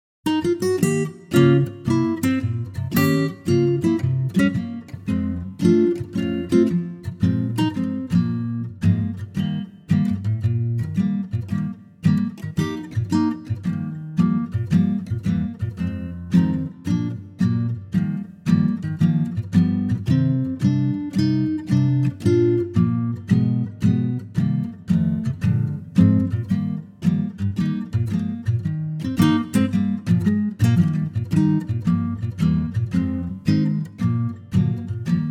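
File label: Eb acoustic guitar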